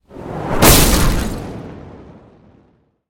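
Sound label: sudden fatal head-on collision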